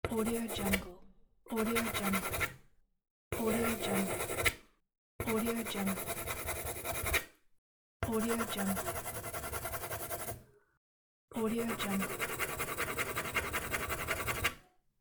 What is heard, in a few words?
pencil drawing